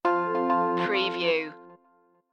snowdrops stinger

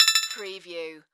Multimedia Alert

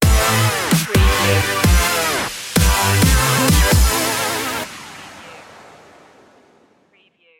flying chords stinger

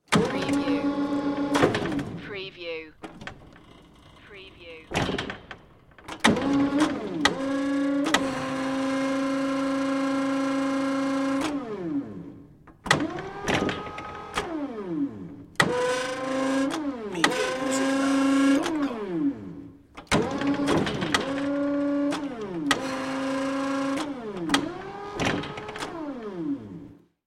Hi Lo Activity; sound-effects-bible

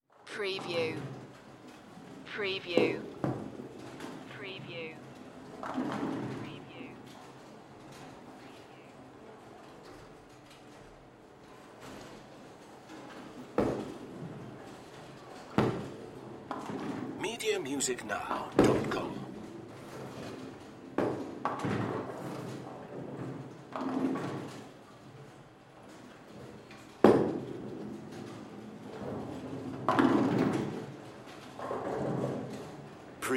Sports Bowling Alley Ambience More Active

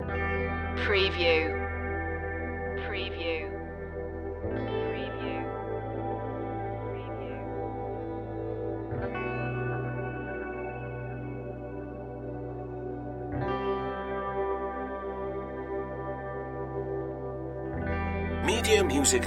ambient flow loop

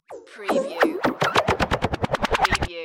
Scifi Production Element; RotoMator

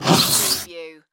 Space Door Open

